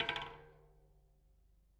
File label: Spoke Bounce, Bicycle